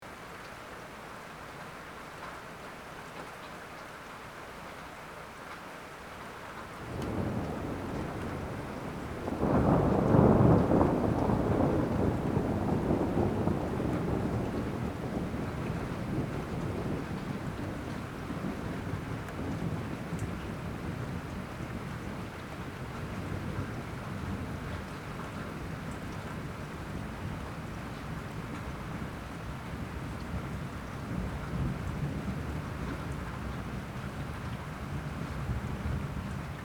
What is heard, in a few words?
steady rain with rolling thunder in the distance